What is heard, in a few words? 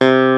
CLAVINET C3; E-MU Formula 4000 Series Vol. 5; Protozoa; Vintage Presets